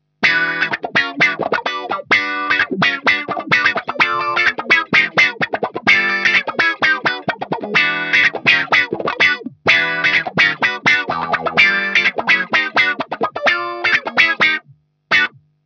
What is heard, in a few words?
hx Autowah